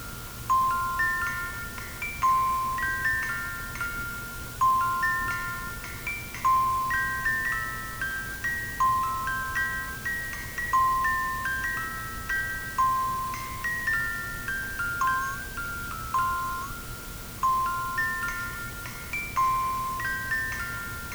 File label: musicbox